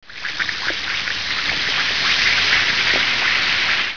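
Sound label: SODA WATER; Simon and Schuster Interactive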